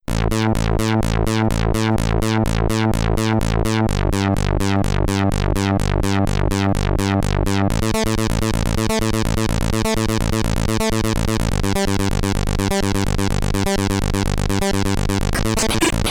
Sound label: Stage 1, SID Stereo, commodore 64; Katakis